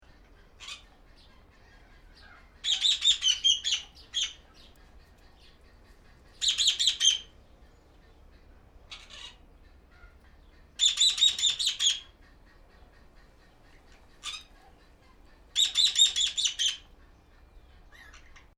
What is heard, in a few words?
green parakeet